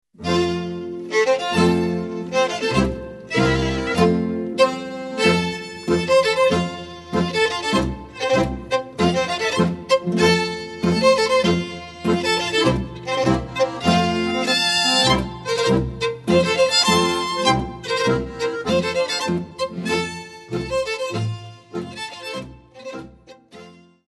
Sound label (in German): marsch